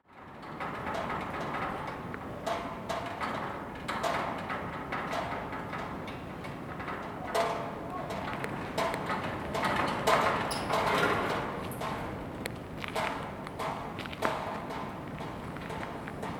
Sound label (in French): ambiance des taules; buzludja